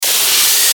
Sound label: Digital Hiss Feedback; glitches; Sound Design; Blastwave